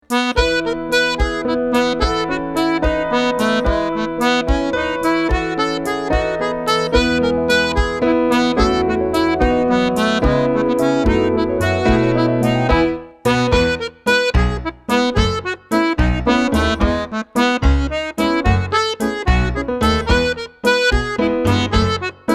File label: Treble Jig; Irish Dance